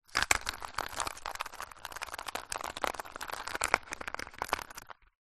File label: household loose ice cubes in ice cube tray movement